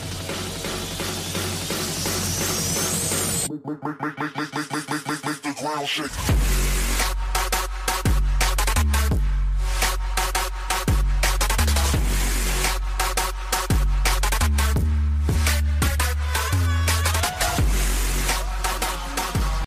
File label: chord iphone 8 remix ringtone